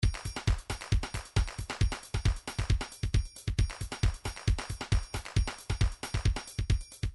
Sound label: trance midi loops beats